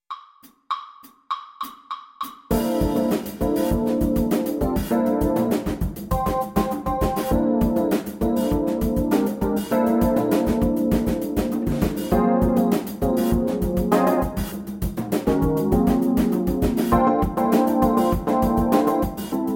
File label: Bass Playalong Jazz A2 (FUNK), Bass Etude A-2 Funk Track; 2025 Nebraska All-State Jazz Band